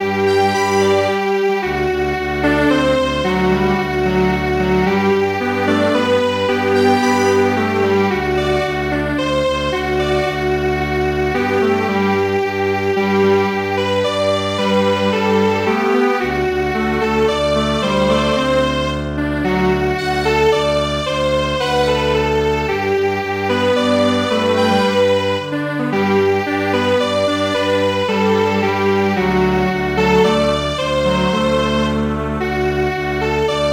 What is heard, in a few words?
Strings Quartet, traditional